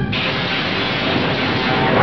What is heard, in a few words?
PHASER